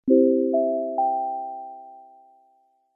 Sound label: sign on